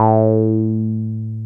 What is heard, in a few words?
TB 303 BASS